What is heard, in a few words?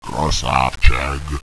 zombie attack; boomario